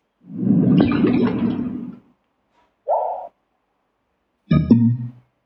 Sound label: soul